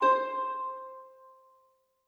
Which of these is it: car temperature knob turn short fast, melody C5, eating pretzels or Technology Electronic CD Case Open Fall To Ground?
melody C5